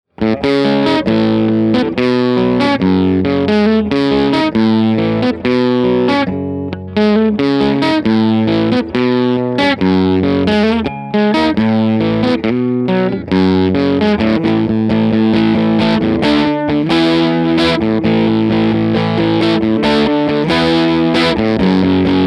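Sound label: TC15 Channel 2 Dirty Crunch Hi hi INPUT; Duncan Custom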